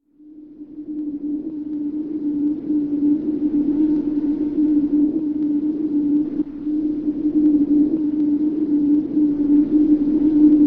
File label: Wind Crackly; for bugbear and witchwood; SyrinScape; Clockwork entities